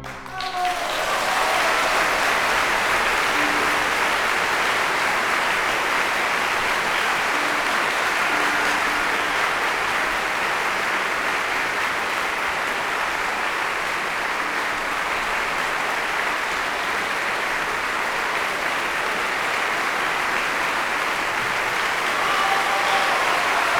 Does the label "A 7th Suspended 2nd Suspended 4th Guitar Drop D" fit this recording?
No